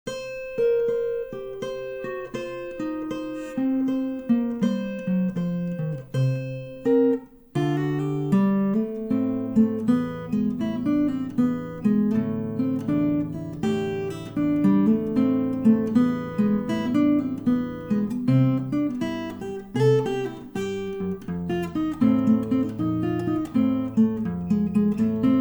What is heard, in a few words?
blues